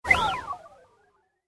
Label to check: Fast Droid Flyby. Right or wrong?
Right